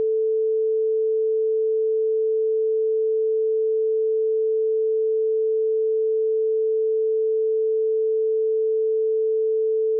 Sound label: left, Test Sounds